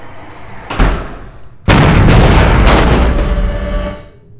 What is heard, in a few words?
Explosion; Secret Files 3; Android